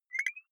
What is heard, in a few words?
beep click high, signale